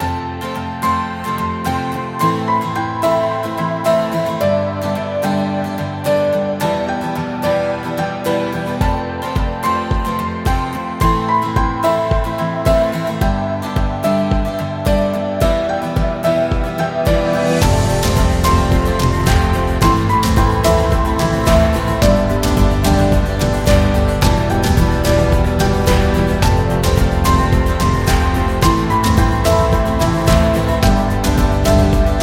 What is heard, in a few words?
Acoustic Upbeat Pop